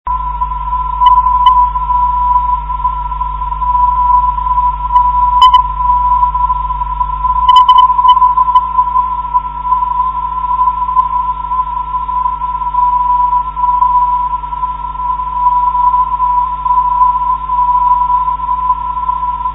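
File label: non-directional beacon, 337 kHz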